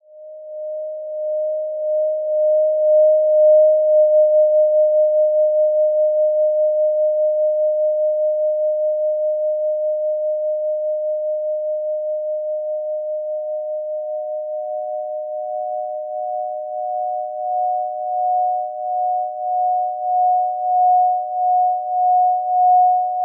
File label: Hybrid 5th Oct Pentatonic Set Fundamental